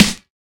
Snares

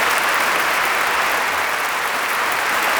Percussion

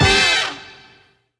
FX, Hit

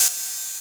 OH, Open Hats

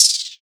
pitchdown OpHat; Open Hats

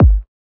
Kick - G; Cymatics - Astro Houston

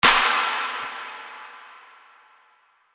Clap

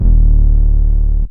808s; Ad808

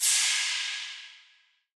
Crash

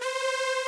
Crunk Synth

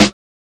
Snares

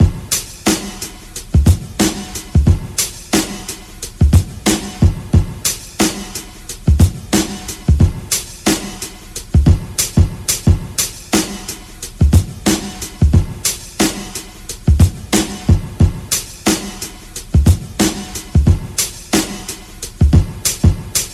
Drum Loop 90 bpm

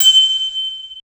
percussion glass hit; Percs

